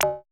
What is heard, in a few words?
perc, Roland MC-909